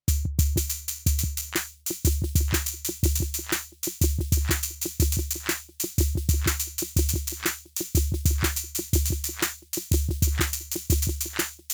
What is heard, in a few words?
Drum Delay; Waves SuperTap